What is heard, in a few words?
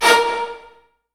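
viola marcato A#2 left, Virtuoso Strings; ILIO; Kirk Hunter Virtuoso Strings VOL-2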